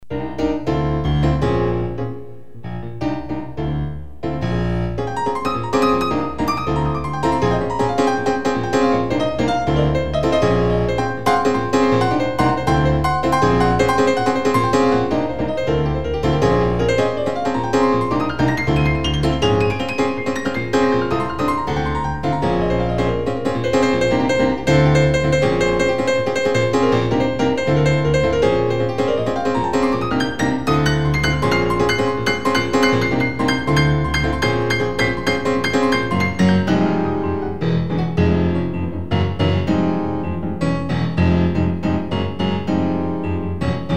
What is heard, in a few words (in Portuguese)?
piano improviso